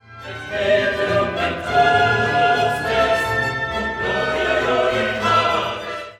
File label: Credo Et iterum